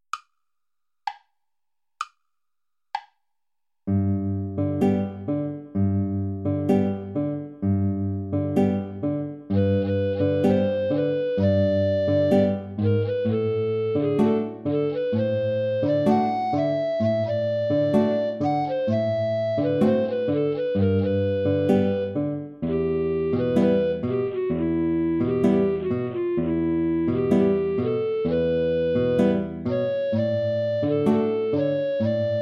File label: tango play along without alto recorder; alba niz isaac